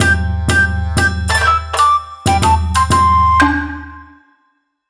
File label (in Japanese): 和風 三味線ジングル 笛と太鼓